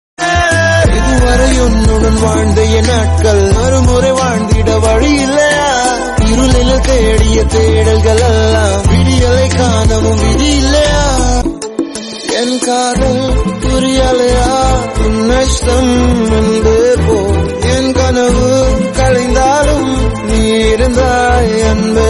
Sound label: romantic telugu